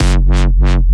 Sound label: Bass